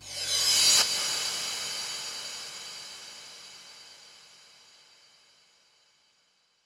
Cymbals Reverse Crash; Vengeance Samples

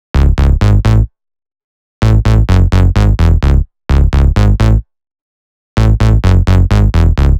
Special Sounds Root C# 128 BPM; Vengeance Samples; VEH3 Special Sounds

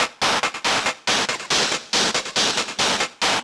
Loop 140BPM; Vengeance Samples; VTS1 Bonus Sounds

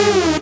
Synths G#; Vengeance Samples

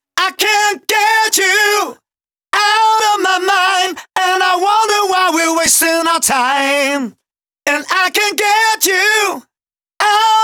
Male Vocals Chorus DRY, 128 BPM; Vengeance Samples; VTDS2 Song Kits

